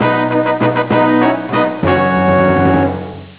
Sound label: fanfare